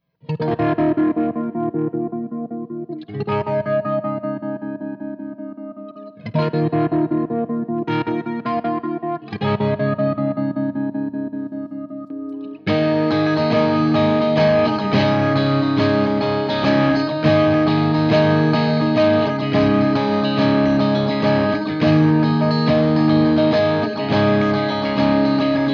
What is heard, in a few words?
jangly dirt; ENGL